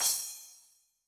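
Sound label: Paper Thin, Cymbal; Yamaha DTXpress-II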